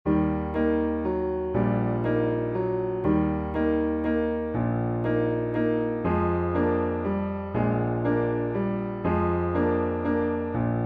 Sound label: PIANO